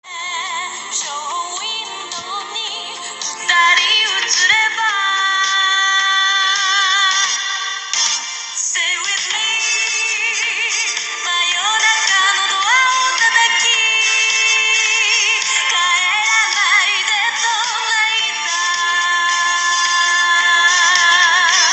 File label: stay with meeee